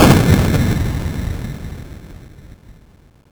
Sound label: Missile explosion; Megaplane